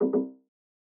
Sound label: error; WIDESEA WMSClient